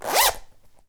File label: zipper